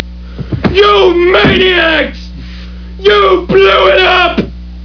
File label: blow